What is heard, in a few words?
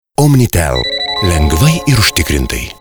start; OmniTel